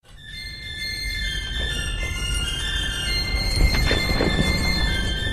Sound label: Songbird Call